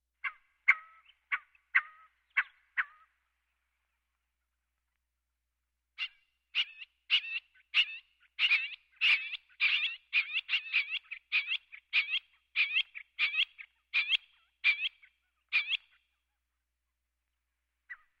quail; BirdCalls

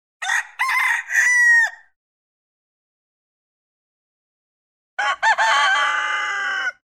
Rooster; BirdCalls

